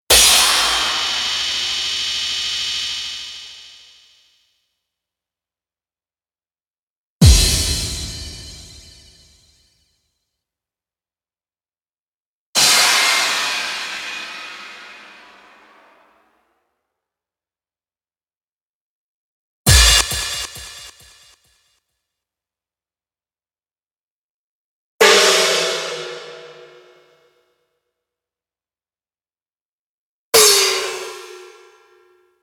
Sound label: Monster Cymbals